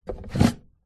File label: foley wooden box case pick up from hard surface; zapsplat